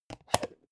plastic bowl small close hard

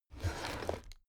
drawer cabinet crammed open regular halfway

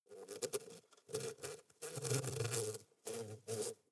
hornet hum

